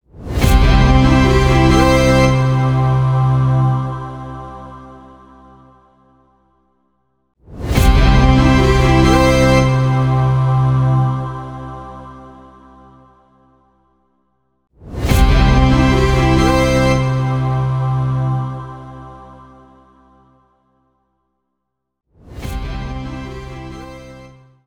Win; Robo Wars

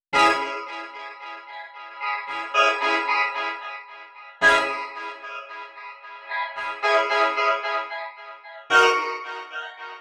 guitar

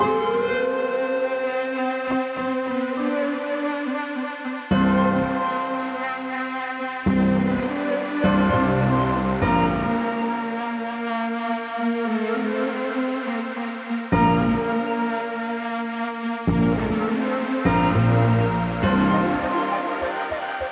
Claws Loop No drums; jenny leclue detectiv

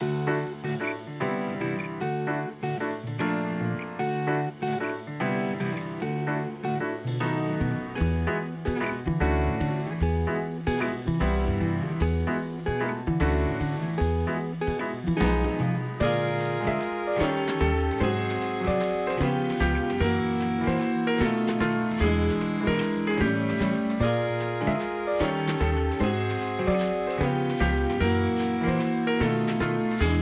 Menu Layer

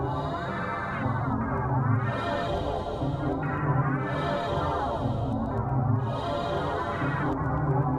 Ambient; Pit and Temple D

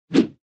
Sfx Lancer Attack

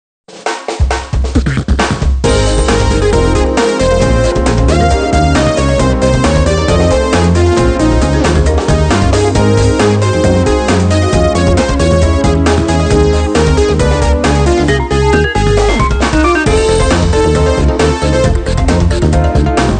Music Success event

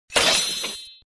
Sfx Claim

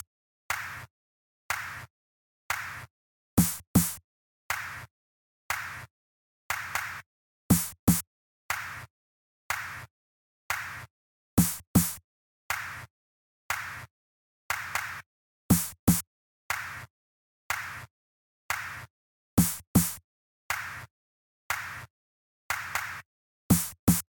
Funky Roots - Metronome; Underhero

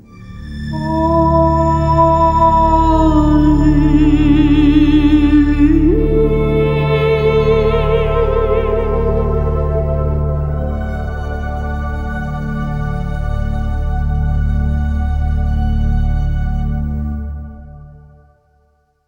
Sting Opening; Armello The Bandit Clan

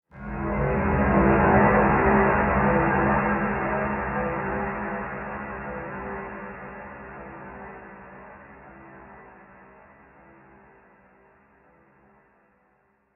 stinger; Joe's Diner

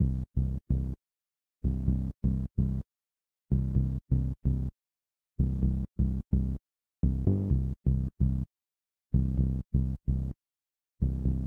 BASS; rain world

